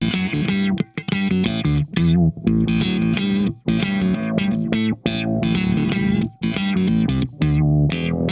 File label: SHOT, Funk Guitar; 90 s Sample CDs; Zero G